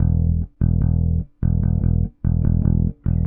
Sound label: Bass, Indie Rock